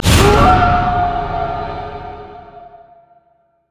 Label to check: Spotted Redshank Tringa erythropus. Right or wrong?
Wrong